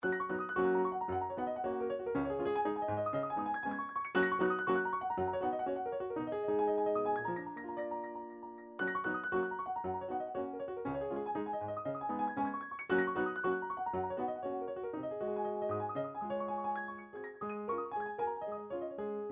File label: piano, midi